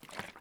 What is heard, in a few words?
tetrapak draw; Escape from Tarkov